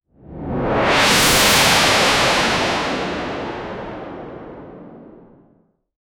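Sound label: transitions short wave whoosh, jet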